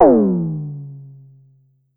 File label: Drum X Tom, Pearl